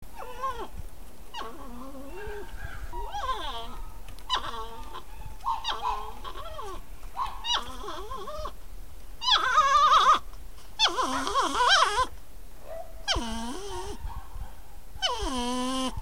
porcupine ravens

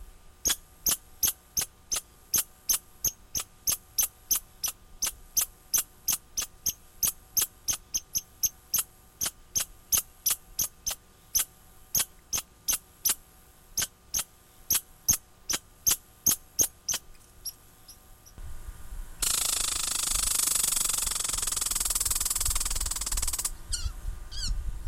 red squirrel chatter

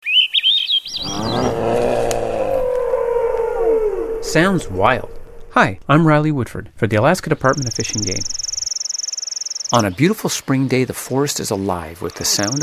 chirping sparrow

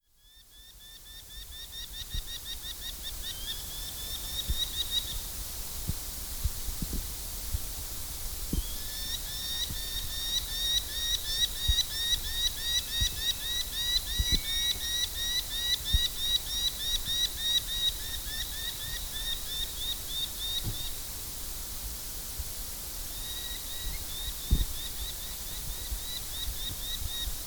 goshawks three fledged chicks; northern goshawk; Eaglecrest

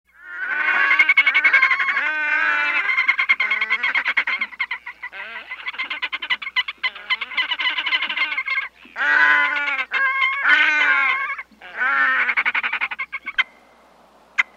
red necked grebe; citizen science

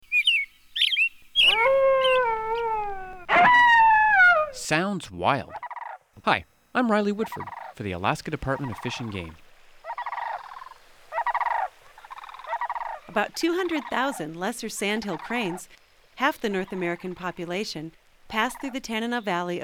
resonating cranes

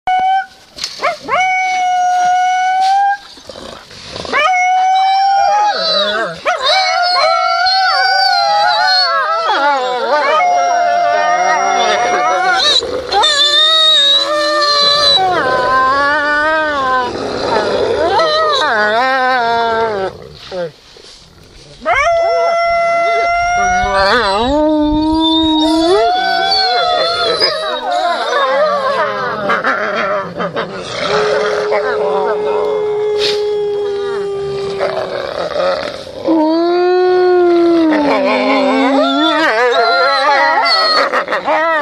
wolf howls snarls

